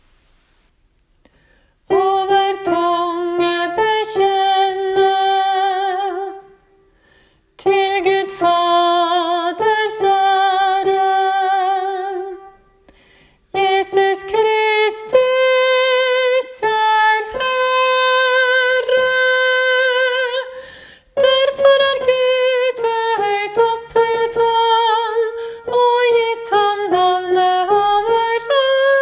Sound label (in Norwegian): bass; kristushymnen side3; åfjord